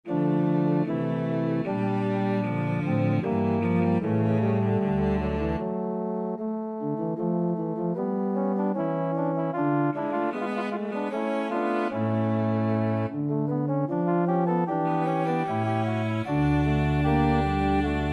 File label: cello